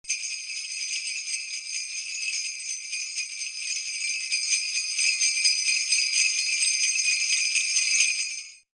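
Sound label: sleigh bells solo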